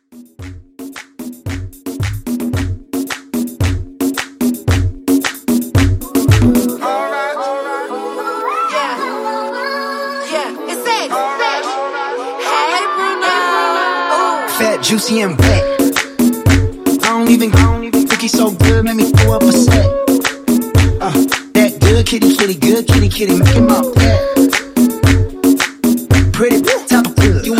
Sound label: Pop Reggaeton Redrum; Remixfreakz